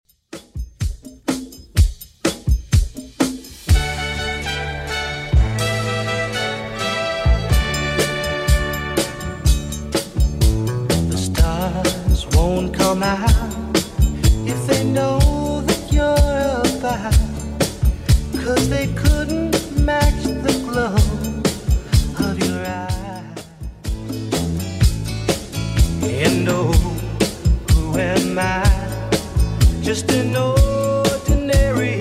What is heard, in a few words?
70s Redrum 125bpm